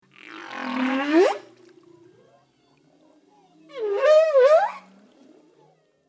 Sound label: Whale Sounds; iMovie '08 Sound Effects